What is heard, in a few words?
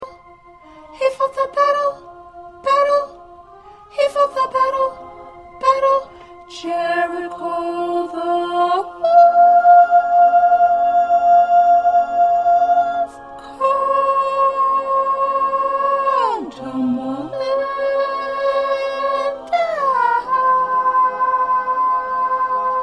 Soprano 2